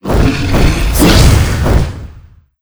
king peregrine

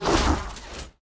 damage

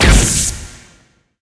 skill explo

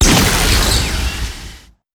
ElectricField atk, monster

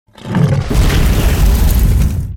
Ancient Dragon, monster

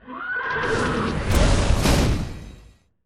fallensteed atk sp, monster

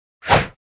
attk

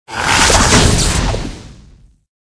snake attack

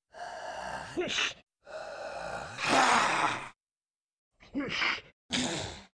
shilder idle, monster

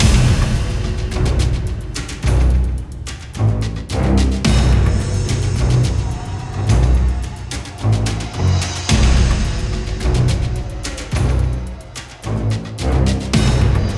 Dungeon Theme Ancient tomb

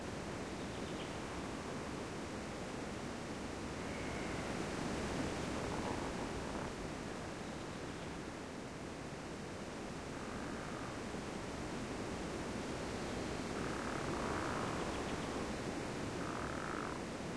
forest, lake

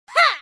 attk

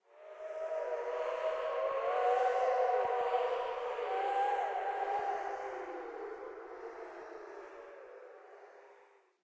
cemetery; portlux

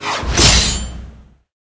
night member attack, monster

